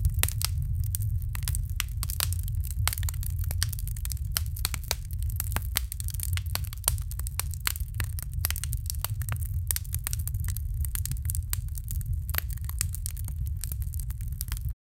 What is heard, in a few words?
fire crackling sound; atmosphere